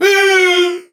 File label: Grunt Hit; Paladins; BombKing